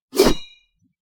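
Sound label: Sword Slash Transition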